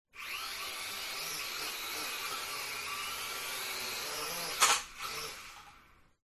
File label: Drone Falcon; fox audio